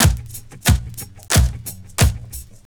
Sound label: VNYL, 90 DRUMS